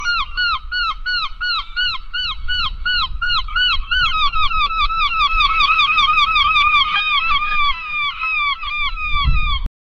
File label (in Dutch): zeemeeuwen, seagulls